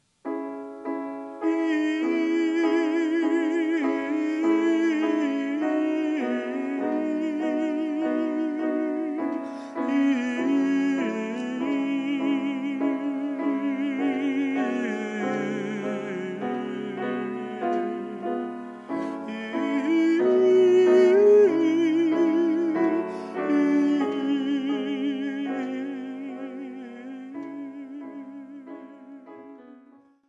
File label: vocalise